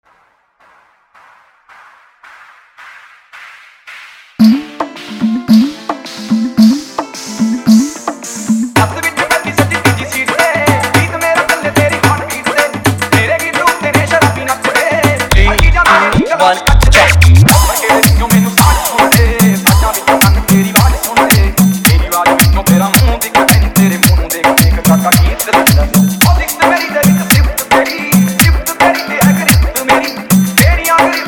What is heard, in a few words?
New Punjabi Dj Hard Remix